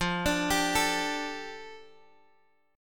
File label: F 6th Add 9th F down Guitar Drop D, chord